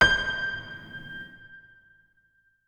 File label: notes, keyboard piano; Hideout Furniture